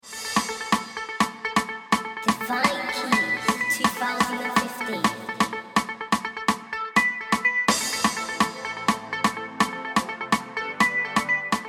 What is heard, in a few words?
Afrobeats